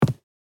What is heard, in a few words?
High heels single step on carpet